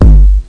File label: tr 808 style, bdrum samples